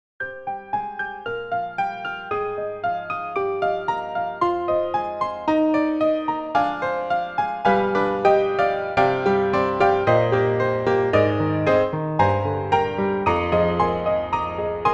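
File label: Broken Piano Slightly Detuned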